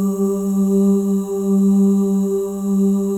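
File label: OH, female OHS, Voices; Spectrasonics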